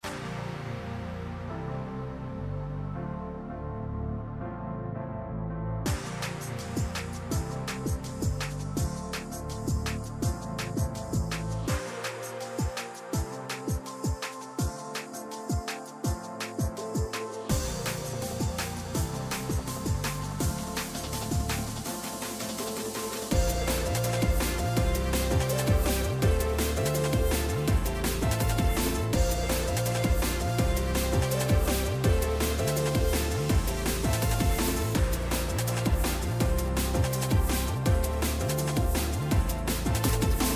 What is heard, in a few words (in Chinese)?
战斗; BGM